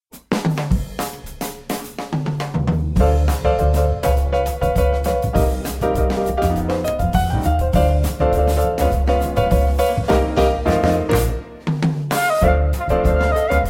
Flute

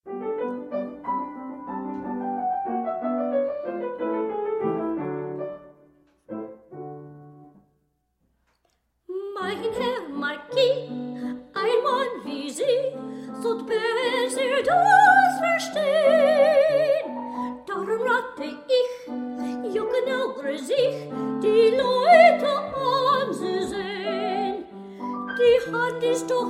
The Laughing Song

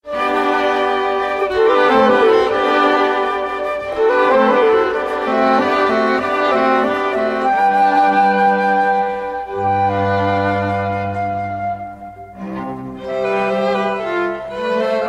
flute & strings; Delibes

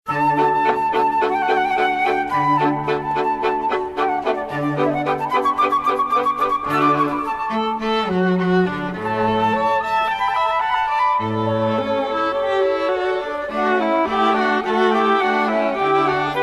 Flute Quartet in D allegro; Mozart

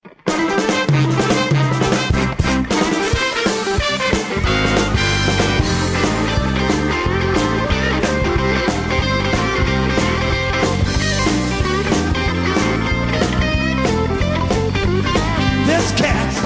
40s Jump Swing Medley

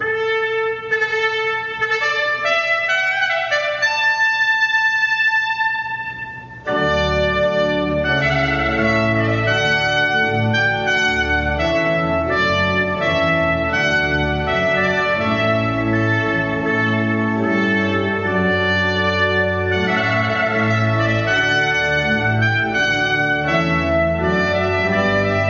Trumpet Voluntary

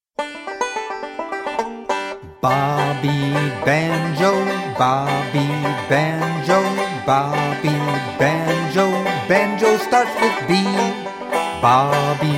Banjo

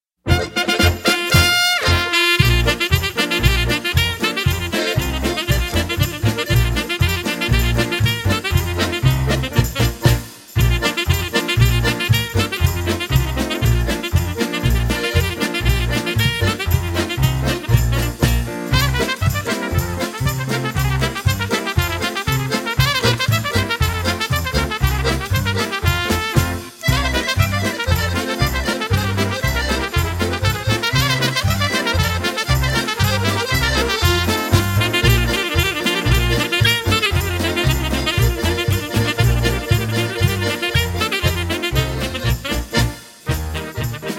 Sax Polka

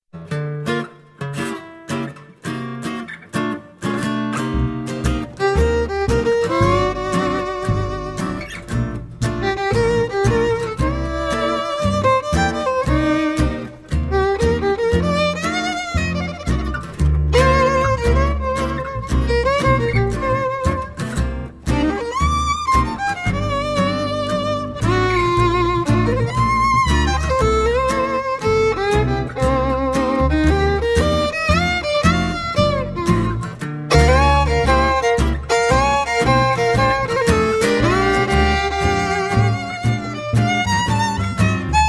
Jazz Fiddle

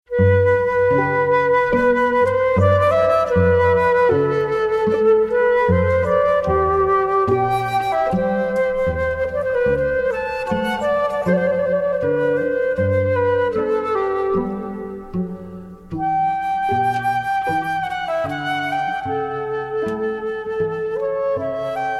flute & strings

